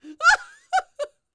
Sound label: LAUGH, Jazz; Spectrasonics Vocal Planet; Jazz FX